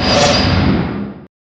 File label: magnet redirect; spirit breaker